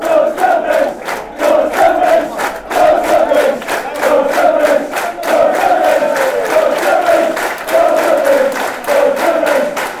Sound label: swansea chant